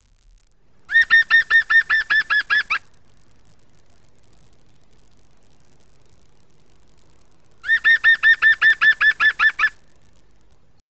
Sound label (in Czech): zvuk, ptaci